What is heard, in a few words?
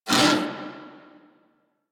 Kubrow Armored Vocal Pain; Enemies; Warframe